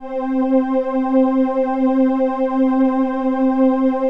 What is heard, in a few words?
LAMBDA, String Machines